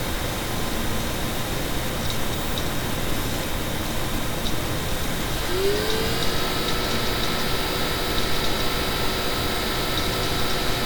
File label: fan noise; sager and clevo; np5796